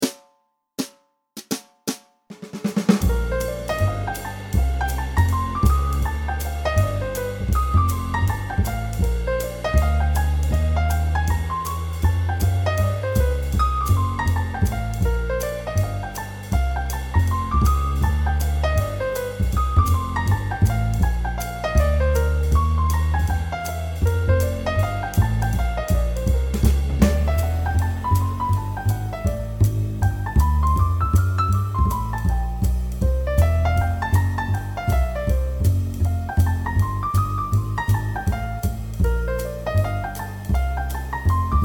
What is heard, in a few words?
basic bop rhythm changes outline